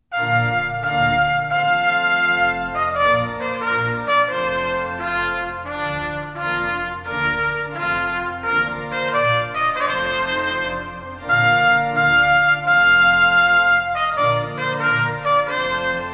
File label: Trumpet tune Trumpet